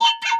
Wizard Mini Reload

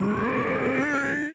Grunt

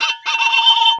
Wizard Mini Laugh

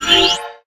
Ice blocks moving

